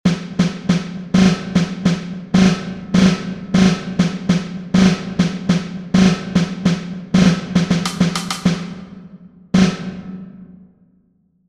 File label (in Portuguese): Toque - Repique